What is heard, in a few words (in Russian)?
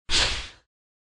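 звук атаки воздушной атаки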